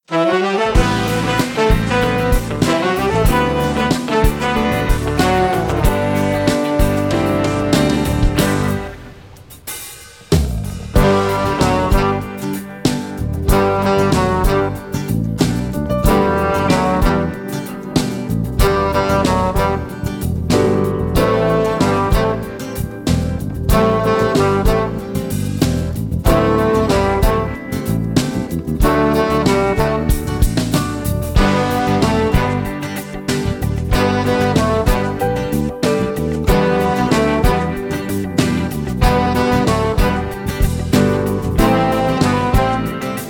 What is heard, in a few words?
honey crunch flute